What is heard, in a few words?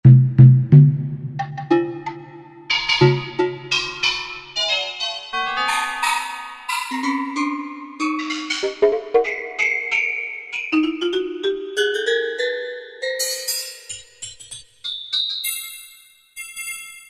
TunedDrum2, Korg T3